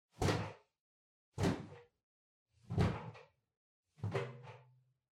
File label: Washer Front Load Far Clothes Put In Strong